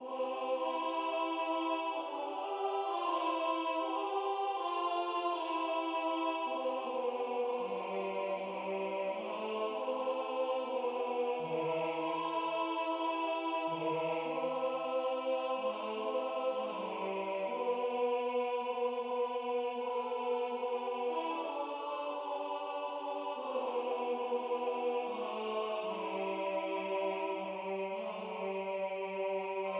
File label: Tenor; Der Jäger Abschied